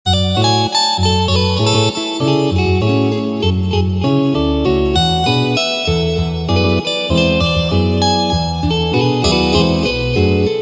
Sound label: Guitar; Boot